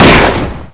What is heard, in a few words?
gun